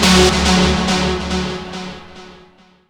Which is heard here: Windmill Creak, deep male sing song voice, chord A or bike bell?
chord A